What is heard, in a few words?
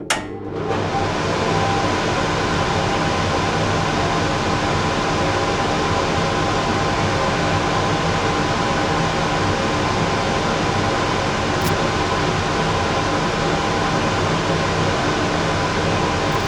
Extractor Fan Kitchen; Extractor Fans